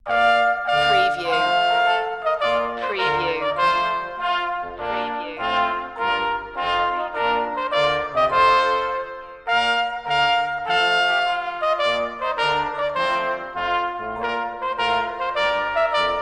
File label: trumpet tune trumpet; purcell